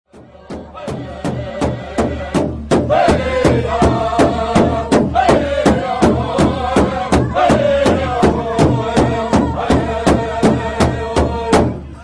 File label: womens backup singing contest powwow; rosbud casino powwow 2006